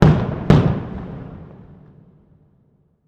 distant gunfire in street or urban area 2 shots fired